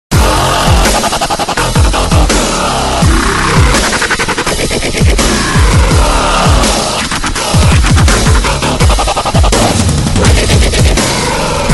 dubstep

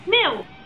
mew

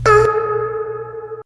uhhh

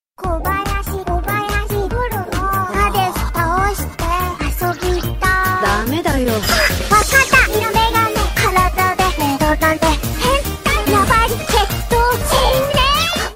cute singing remix; kobayashi; kanna kamui

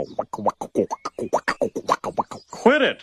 beatboxing

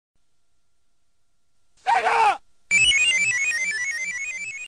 logo scream; sega

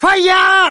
haiyaaah

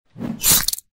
spear hitting

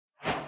minecraft ui toast

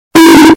loud bonk

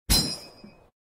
headshot sound effect; krunker io

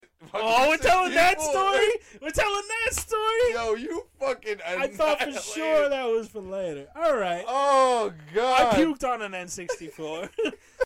puked

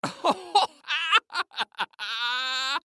laugh hysterical male

